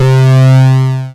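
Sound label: synth analog; Best Service Gigapack 1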